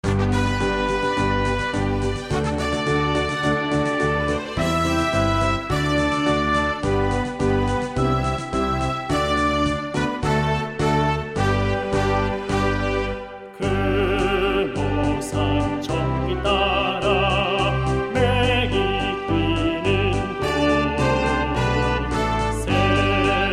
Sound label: music